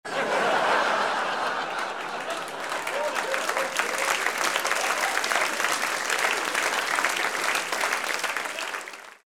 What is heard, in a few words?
laugh applause